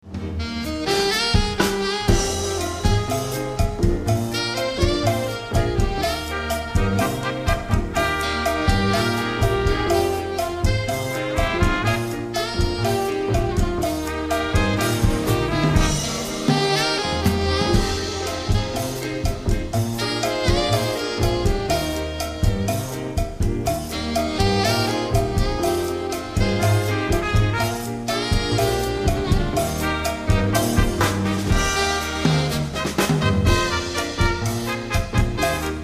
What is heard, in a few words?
bigband